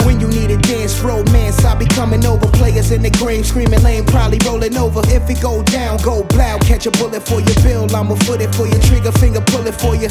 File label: Hip Hop